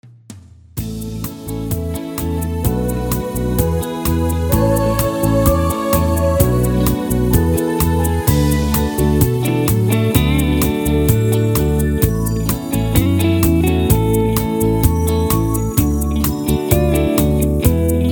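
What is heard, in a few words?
Instrumental with background vocals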